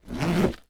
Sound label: Household Backpack Unzip Side Pocket